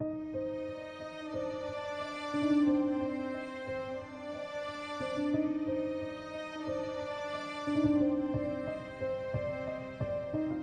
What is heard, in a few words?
strings and piano